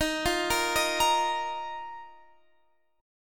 Eb Suspended 2nd, Guitar Half Step Down

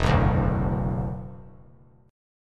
E 7th Suspended 2nd, down; E7sus2; strummed

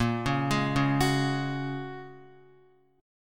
Bb Minor 7th Sharp 5th, Guitar

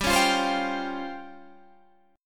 Db Major 7th Flat 5th G, chord

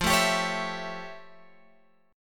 F Augmented Major 9th F Guitar Open F; F+M9; strummed

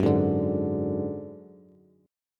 Gb Suspended 2nd Sharp 5th, Bass; strummed; x,9,6,7

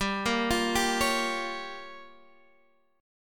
G Minor 7th Flat 5th, Guitar, Half Step Down

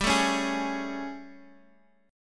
A Major 7th G

